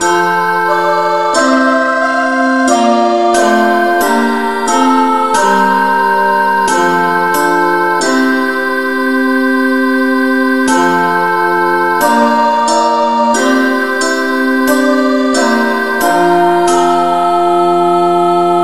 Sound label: no vocal key F